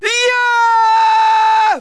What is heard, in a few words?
melee; svencoop